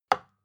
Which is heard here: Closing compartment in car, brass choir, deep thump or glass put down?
glass put down